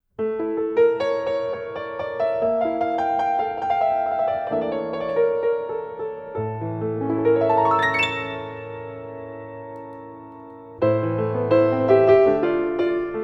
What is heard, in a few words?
Instrumental; Israel